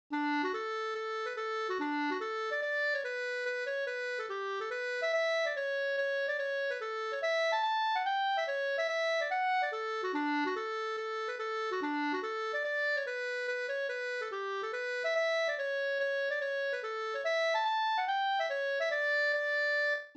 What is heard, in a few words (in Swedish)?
mazurka; Gubbstot Nr2 e Sigfrid Hellberg Sd